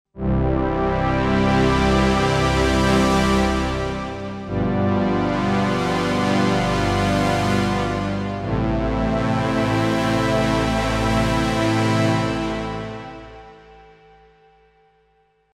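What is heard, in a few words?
Cinematic Patch, synthesizer; Cobalt8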